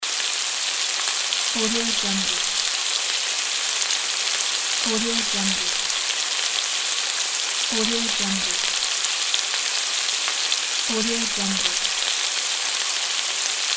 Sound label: frying in pan